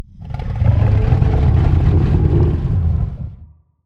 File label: idle, Charybdis